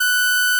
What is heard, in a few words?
Inharmonicity F#6